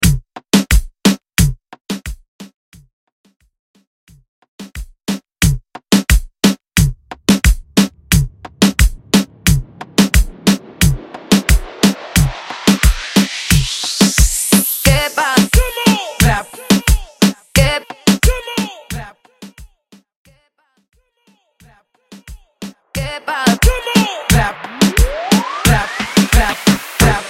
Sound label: Reggeatton Mainstream Hype